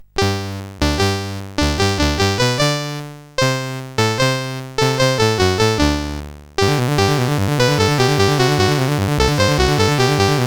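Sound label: Maze, MOS 8580 SID chip music, games; CSG8580R5; hvsc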